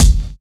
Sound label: puffy top end kick drum single hit g key, Royality free kick one shot tuned to the G note